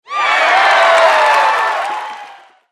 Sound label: cheer; Looney Tunes - Avalanche Snowboarding